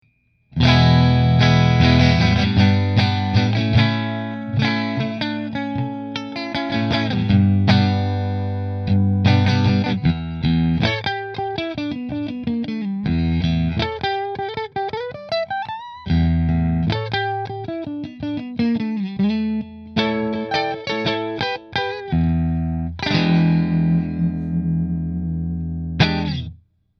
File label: Position 1 Fender; Ranger